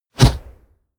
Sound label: push